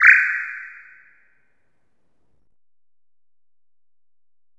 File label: SE MAP BUTT; maimai